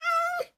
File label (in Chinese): 猫流浪者待机声